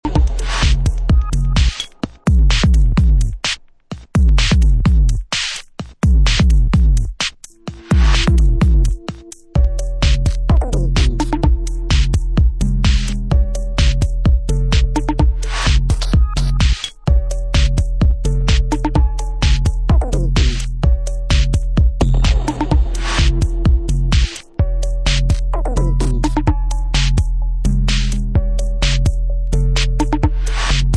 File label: Techno